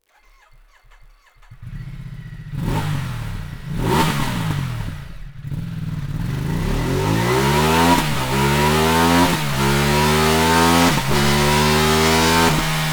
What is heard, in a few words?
Sound Slip-On